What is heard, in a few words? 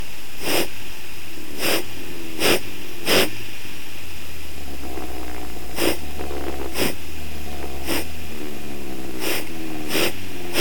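8 before top dead centre primary; Cam advanced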